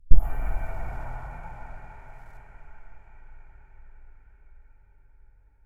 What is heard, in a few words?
Cave Music, Intro Crowd Stinger